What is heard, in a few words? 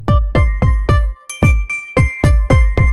funny